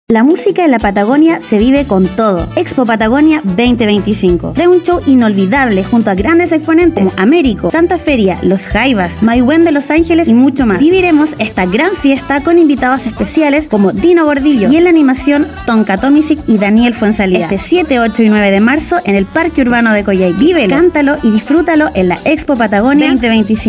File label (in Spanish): con musica